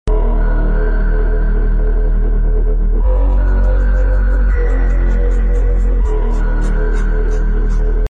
2 measures in 3: tonic, then descent from minor third